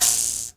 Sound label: MOUTH BOW, Heart of Africa